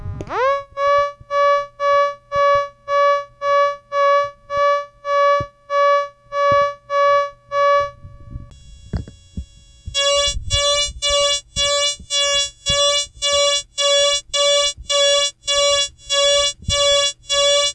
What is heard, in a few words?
sov sound